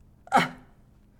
Male Gasp

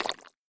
Slime jump

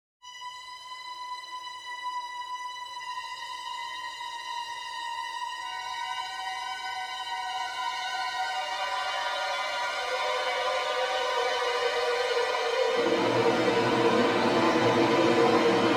Horror Movie Strings; Mcmorrise

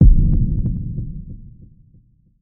Cinematic Drum Sub

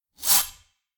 Swipe

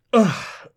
Groan

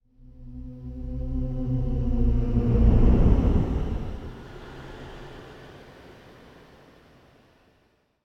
Horror Ghost

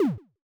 Projectile

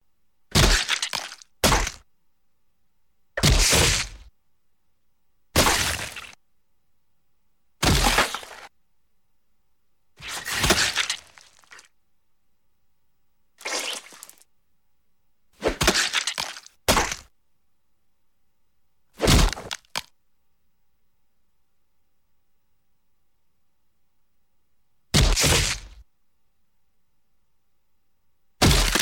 Body Pacts Mixed Splat Bone Gore Impacts

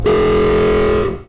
Detonation Alarm

